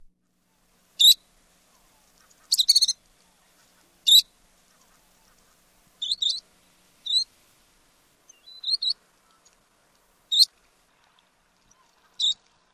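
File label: calls, least sandpiper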